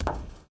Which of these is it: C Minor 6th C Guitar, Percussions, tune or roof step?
roof step